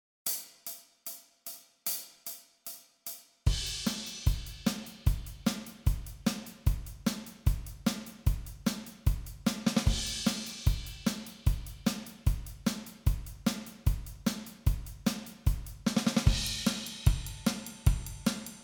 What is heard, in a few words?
song form 150 bpm; practice tracks